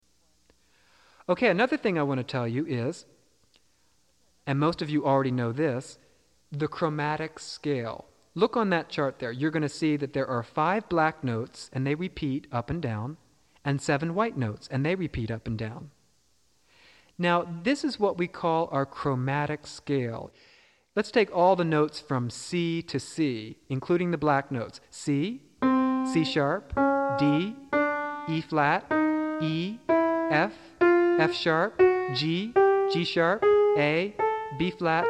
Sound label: The Chromatic Scale; Уроки абсолютного слуха